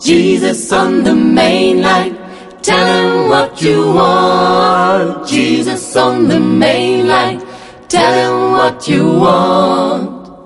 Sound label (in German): Gospel Klingelton